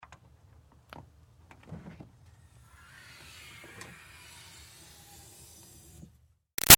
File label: Car Highlander Trunk Open; 2005 Toyota Highlander V6; Vehicles; BigRoomSound